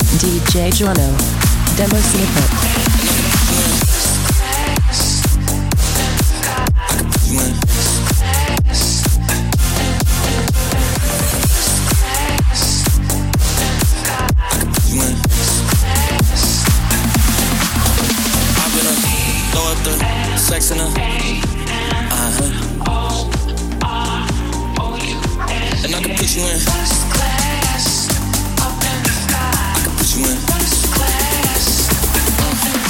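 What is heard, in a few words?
Slap Bass 126bpm G minor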